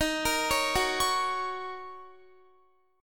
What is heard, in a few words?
Eb Minor 7th Eb Guitar; Ebm7 arpeggiated